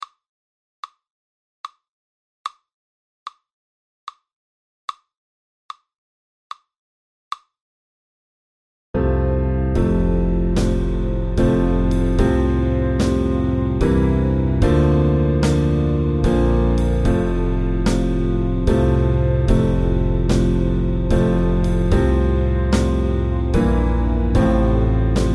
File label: amazing grace kar, trombone